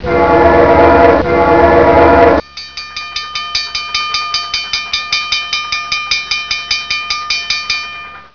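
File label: railroad crossing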